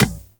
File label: Beach Ball Kick; Beach Ball Floof; Water Fight